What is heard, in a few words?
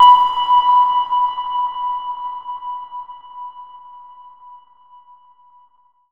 Sonar Verb; Zero-G Sound Sense Grime Scene; 90 s Sample CDs